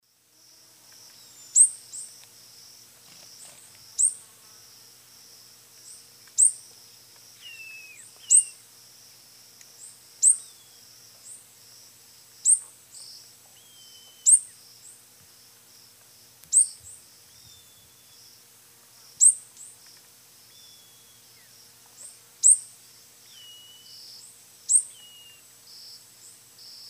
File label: Cyanerpes nitidus, call; S. America; Eastern Lowlands